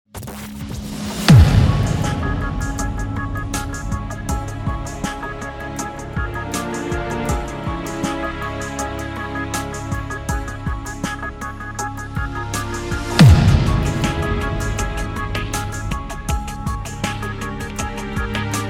mega funk

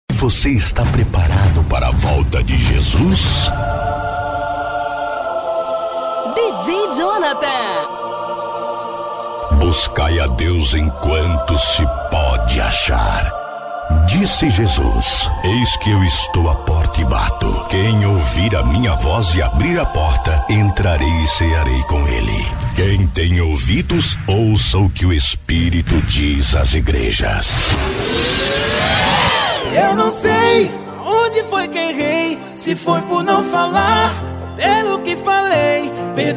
rap gospel rom; romantico 2k17

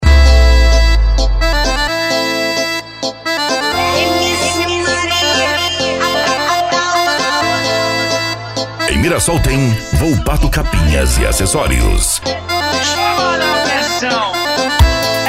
sertanejo remix

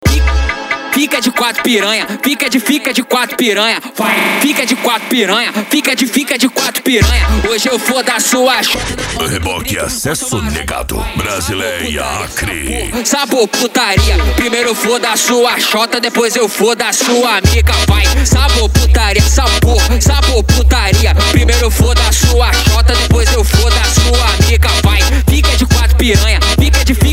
racha eletronica e funk mega bass

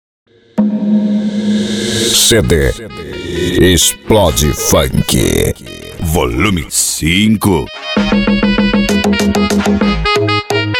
explode funk

